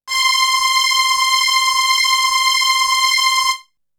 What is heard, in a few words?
Strings; ZERO G - Datafile DISC 3